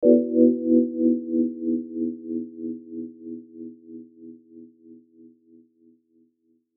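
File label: Musical Element, Drum Snare March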